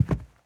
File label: Footsteps Sneaker Carpet Walk